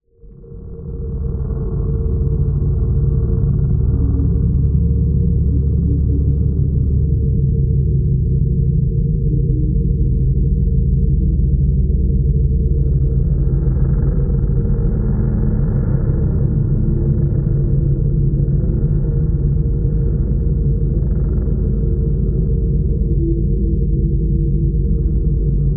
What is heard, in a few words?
Designed Drone Ambience, ElectroMagnetic Field; Forbidden Cave; Spectravelers; Quantum